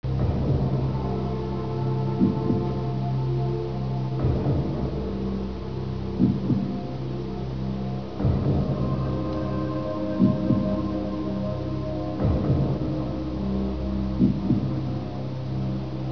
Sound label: bgsound